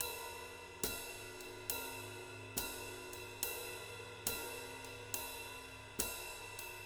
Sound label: JZ70 SWING, Living Drums